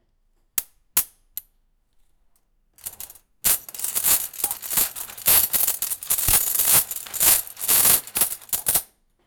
blacksmith, workshop

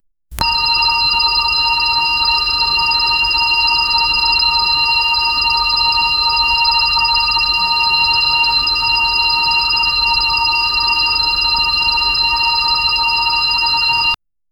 vintage landline phone

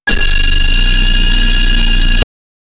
damaging sound

